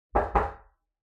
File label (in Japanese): 扉をノックされる音